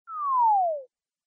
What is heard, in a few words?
lose